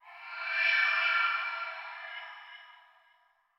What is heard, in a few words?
reveal found; loyalty and blood viktor origins